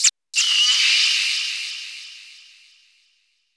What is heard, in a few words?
FX, Hip-Hop & R&B; USB Soundscan vol.47; AKAI